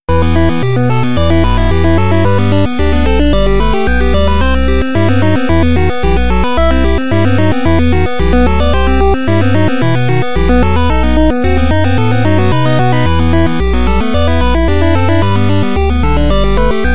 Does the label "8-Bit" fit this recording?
Yes